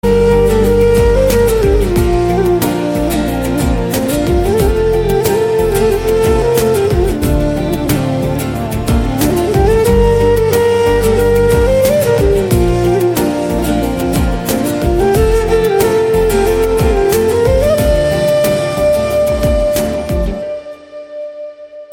instrument

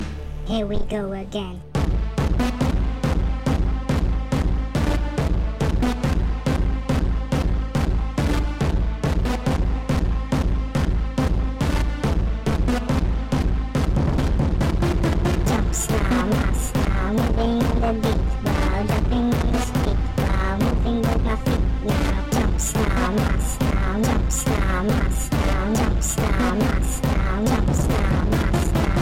Jumpstyle

